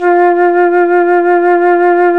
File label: FLT FLUTE, Flt vib; Roland L-CDX-03 Disk 1